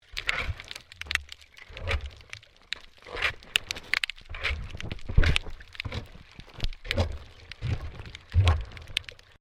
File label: MAINTAINENCE WORK UNDERWATER SHOVEL SCRAPING